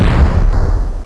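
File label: explosion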